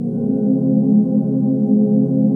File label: ATMOPAD; Best Service; XX Large; Pads